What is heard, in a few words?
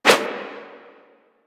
Sticky Bomb Idle Catch Whoosh; Grineer; Veilbreaker; Vor